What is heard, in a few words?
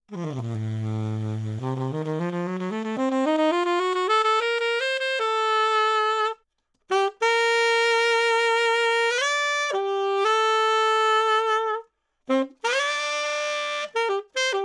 Sax Tenor 20cm Low